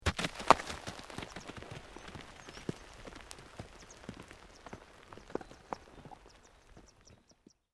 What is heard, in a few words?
ROCK TUMBLING